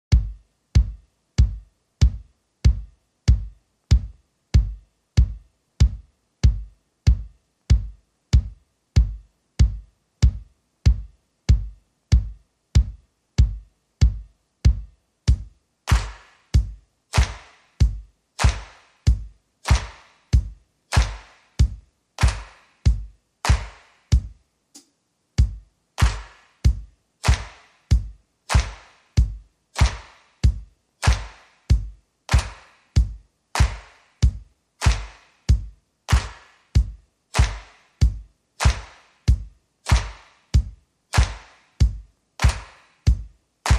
Drums and Bass

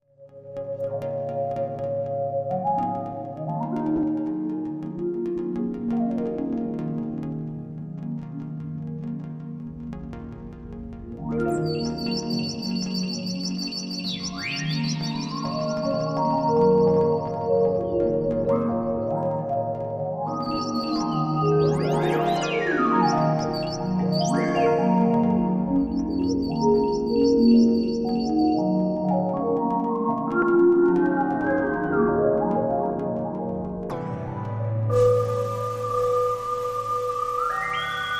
Jungle Illusion Musical Pulsers

